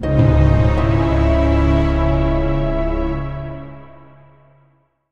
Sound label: Creepy Music; Good Pizza Great Pizza; Android iOS; Rival